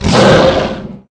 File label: Monster